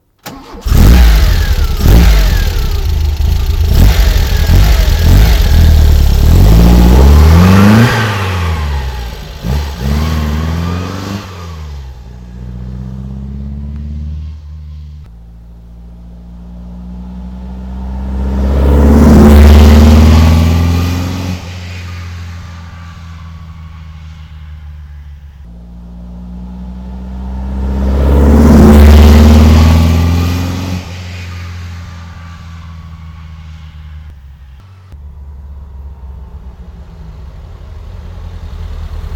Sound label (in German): vw buggy sportauspuff